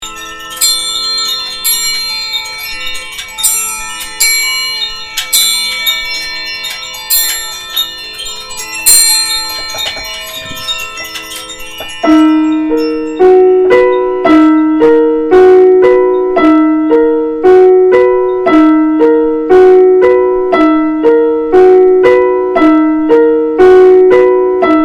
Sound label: Ostinato; Compassion